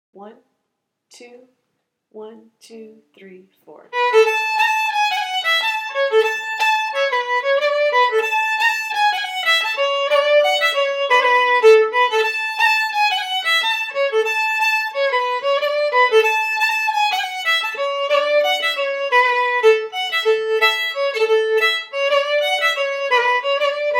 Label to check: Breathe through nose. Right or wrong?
Wrong